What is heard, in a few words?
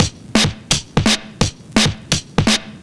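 Drum and Bass Beat 170, drumnbass 170bpm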